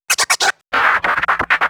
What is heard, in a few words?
Vocal Scratches 140BPM